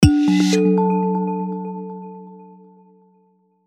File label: Switch ON, phone; Rise 54; Orange